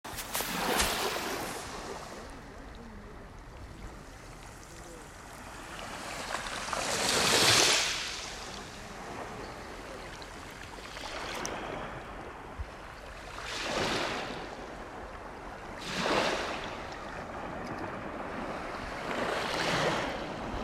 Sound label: Wavy shells